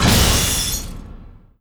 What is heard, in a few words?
Enemy Iceberg Active One Arm Press